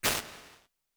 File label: Strong Ambience, Lexicon 480L Reverb impulse response; Big Gee's